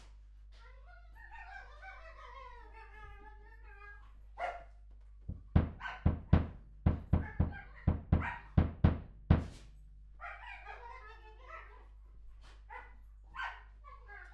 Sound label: Voc Omni